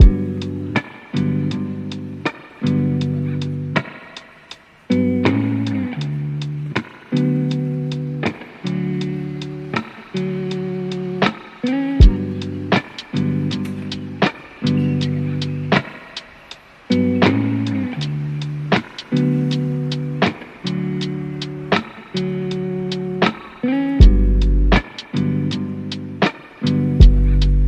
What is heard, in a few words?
traffic